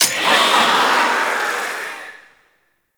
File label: Grimoire Vulnerable Impact; Enemies; Warframe; Entrati Doppelganger